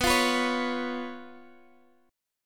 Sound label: B Suspended 2nd Flat 5th B Guitar Open D